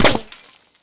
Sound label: stone, hit; tacz-tweak-pack; taczmechanics